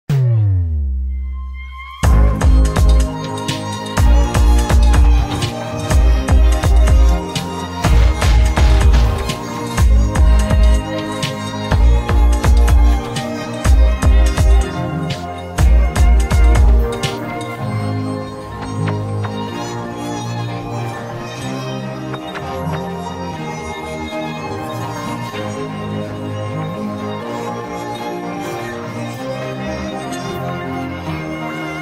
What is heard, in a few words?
Space ringtone